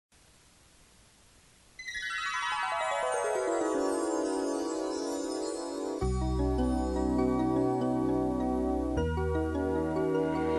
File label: Dolphins